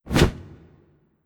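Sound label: Surprise swoosh In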